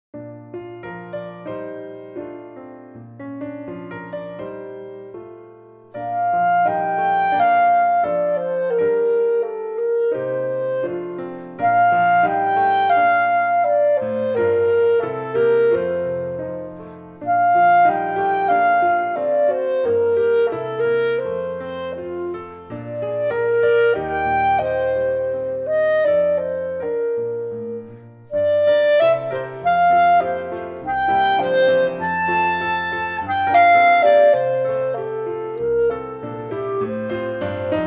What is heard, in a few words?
Song Without Words Full Clarinet